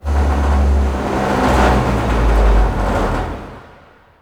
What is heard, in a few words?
Destruction Texture; Warframe; Corpus; Railjack